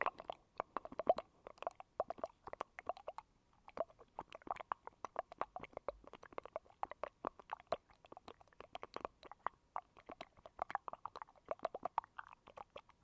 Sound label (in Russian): puzirkovij kotel